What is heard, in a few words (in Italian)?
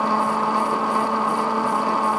Veicolo, motori